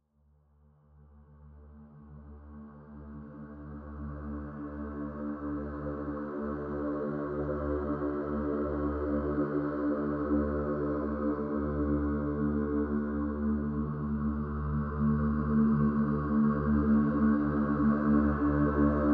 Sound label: choir fade